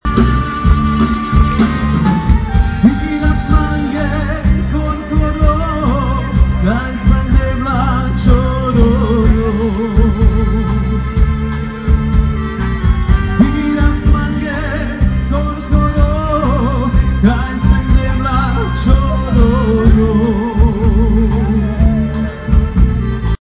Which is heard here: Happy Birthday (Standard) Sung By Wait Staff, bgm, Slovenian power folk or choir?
Slovenian power folk